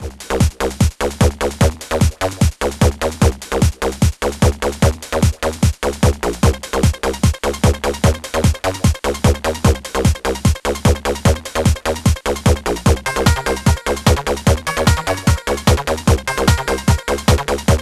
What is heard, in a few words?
Techno Beat